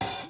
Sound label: hat, open hat